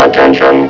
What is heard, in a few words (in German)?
Achtung; FirstClass; VillageSettings 3.80